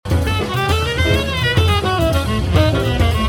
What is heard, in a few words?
solo V7 over ii